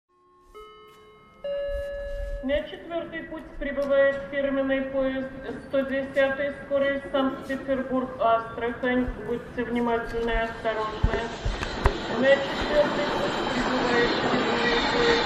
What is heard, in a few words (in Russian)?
zvuki vokzala